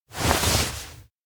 cloth rustle close; makoto; anemoi

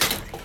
Bicycle collision; anemoi

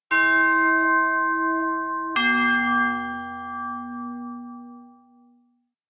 bell toll; anemoi

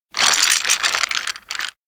pulley; anemoi

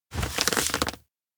letter clench; anemoi パッケージ版

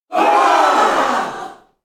crowd roar; anemoi

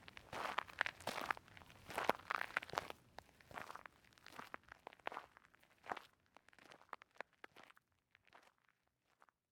footstep basic dirt normal; anemoi

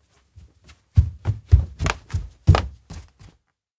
steps indoor run up; honouden; anemoi パッケージ版 1.1

